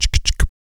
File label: VOC PERC, Vocal Planet Jazz FX BASS PERC